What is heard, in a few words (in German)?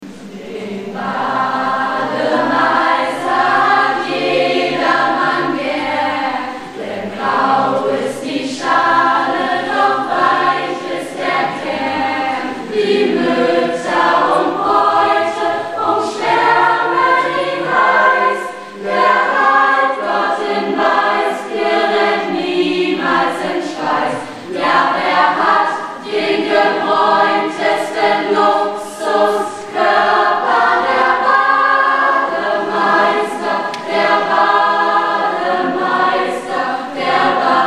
Choral